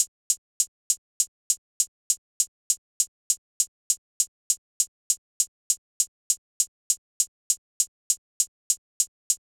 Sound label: Drum Loop - 100 BPM Hihat, West Coast; Loop Stems; Cymatics; GHOST (Beta Pack); Flow Drum Loop